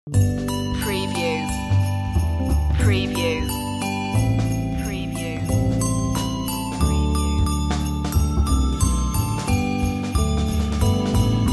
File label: jingle bells jazz handbells sleigh